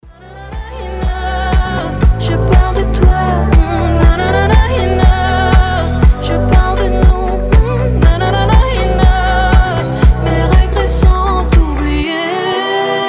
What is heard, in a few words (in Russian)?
francuzskij pop